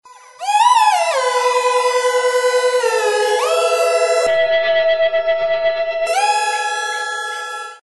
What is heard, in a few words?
Synth oh my god; amore&guerra(2006)